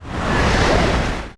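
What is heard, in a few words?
ambience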